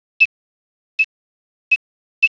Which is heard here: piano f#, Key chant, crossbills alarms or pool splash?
crossbills alarms